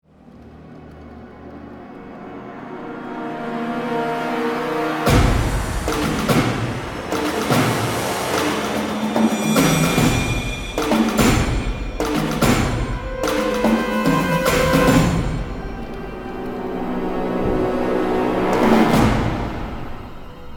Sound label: Thriller Horror Fear